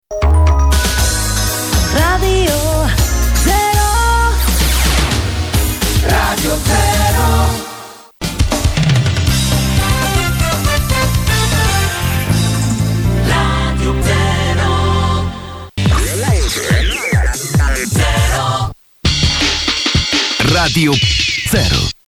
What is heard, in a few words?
Jingles; Rzero